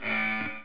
Buzzer; Free Hearts; Gambling

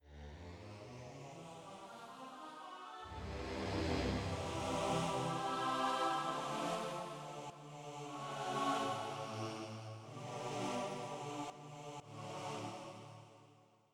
Slow World Vox; SoundFont - Traditional, Voice & Keys - Volume 2

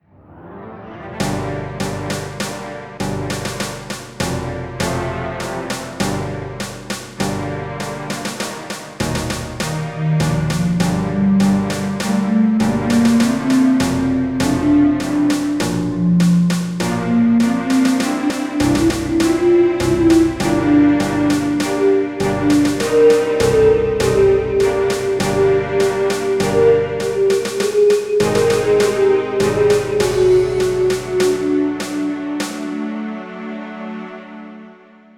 ent march

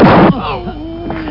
OOOH; red wolf; breaking heads

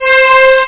harsh string; OctaMED SoundStudio V1; strings

